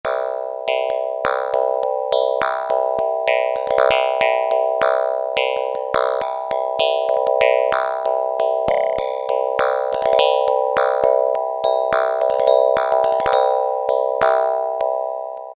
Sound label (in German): Maultromml, Kawai K1m